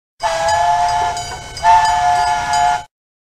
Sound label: train whistle sound effect